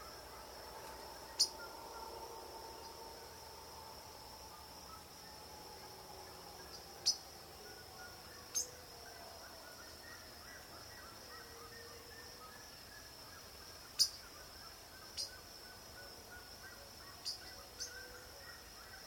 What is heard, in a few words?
Magpie tanager